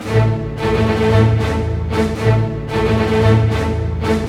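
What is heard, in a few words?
112 war, orchestra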